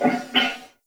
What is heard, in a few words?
Wolf Breath; Animals; Duviri